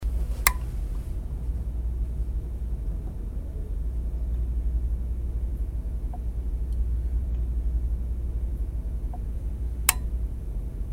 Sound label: Clicking Sound